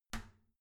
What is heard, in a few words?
toilet lid close soft; haus-garten; badezimmer